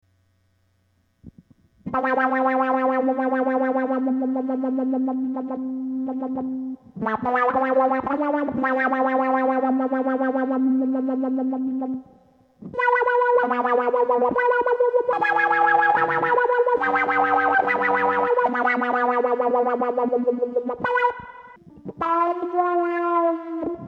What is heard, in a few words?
nurse no fuzz